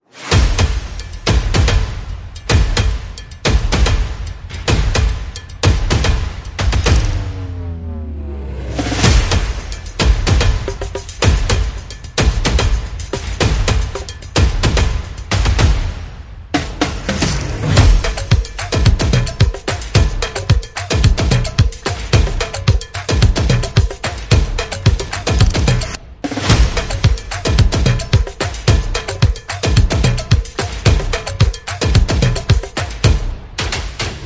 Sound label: Percussion and Effects